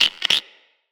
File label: Ext Insect; Midrath Island